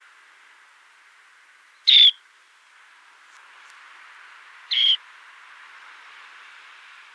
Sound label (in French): becasseau variable, chant